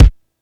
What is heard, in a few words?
Kick, Yamaha PSR E305